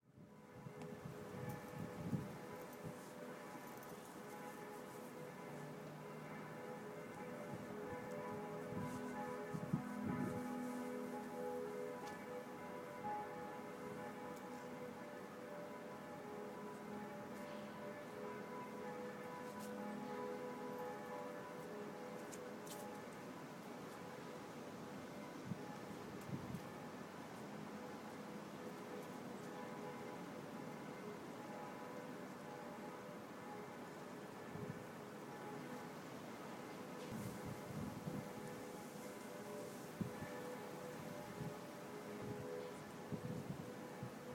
iga park traditions schiff bells

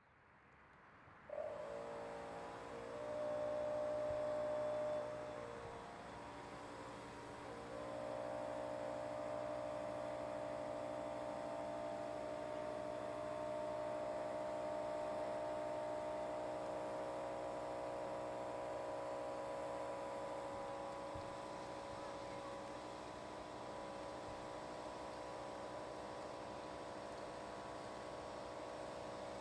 harbour noises from boat

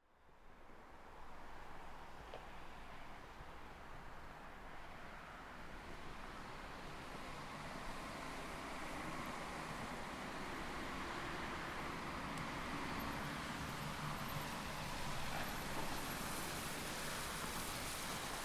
sbahn luettenklein

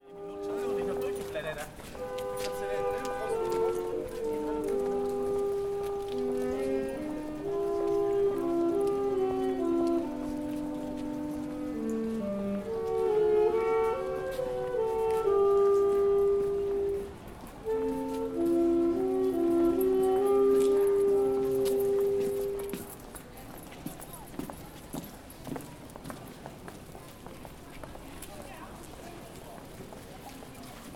street musicians 2 saxofonists; kropstrasse; from latvia

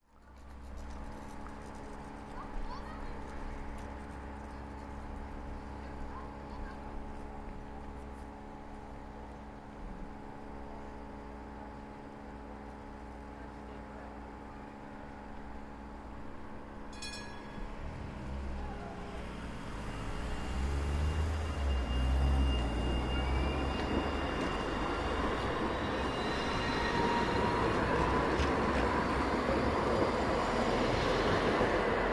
tram station; lange strasse

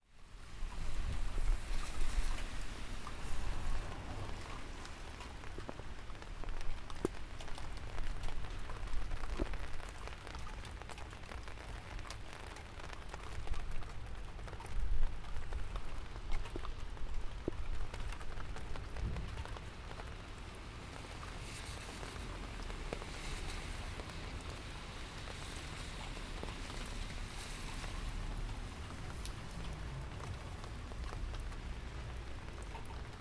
rain on windowsill

